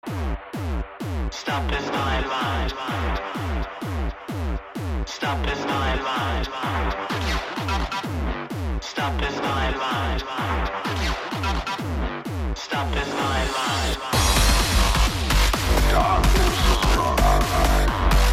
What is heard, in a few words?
industrial powernoise; powerbase